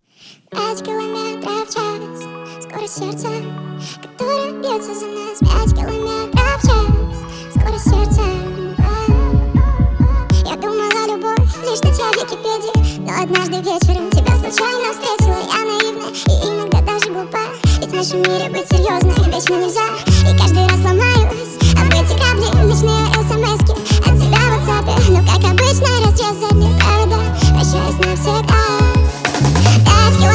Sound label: Speed Up